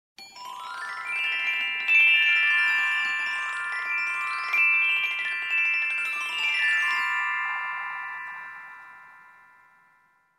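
marimba musical instrument high frequency short melody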